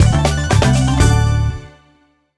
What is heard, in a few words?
big win End; fruit shop